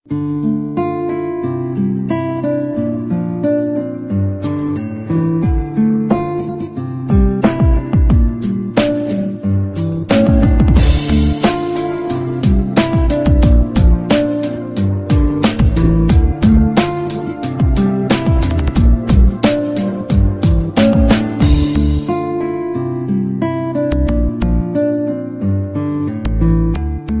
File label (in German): Classic Romantische Musik Relax